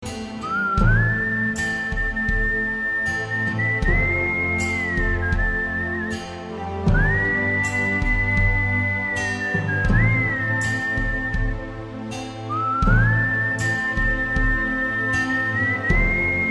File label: Whistle